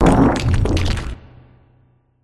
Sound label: cry